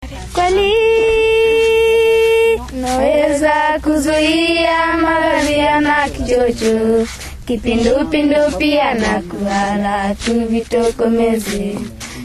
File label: singing with flipflops; UNICEF radio